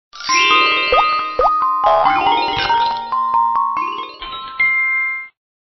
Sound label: Korea chime